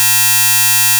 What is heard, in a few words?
16 bit quantization error 100 hz interval